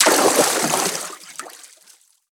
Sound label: Water Jump